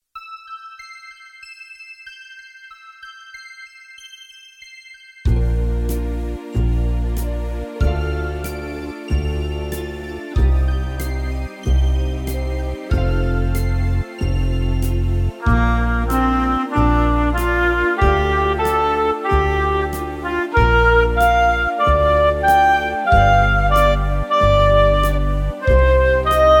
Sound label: Christmas Ballad